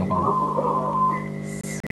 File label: hayabusa beacon